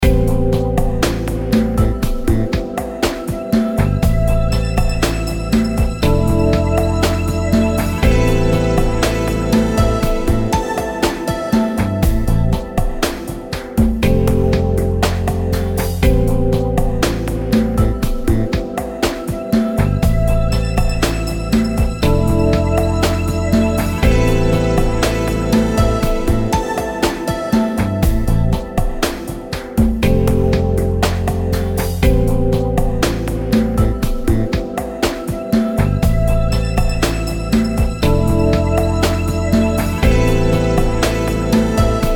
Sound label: relaxed, Music Loops; Music Loops Pack Bundle